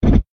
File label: stop button; Si Xiang